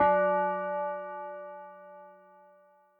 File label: BELL; Adam's Venture Origins